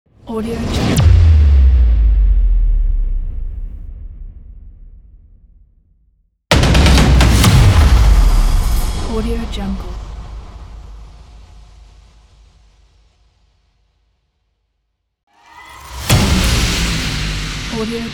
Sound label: epic stabs